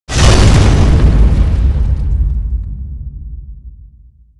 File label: echo slam echoes; Dota 2